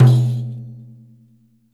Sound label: DAIRES OPEN, Mediteranean Traditions percs